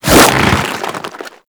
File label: Infested Ancient Arm Attack